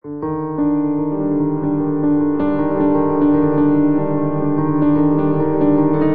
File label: Ostinato Cinematic Romantic G Minor 78 bpm, Grand Piano; MIDI Clips; Ableton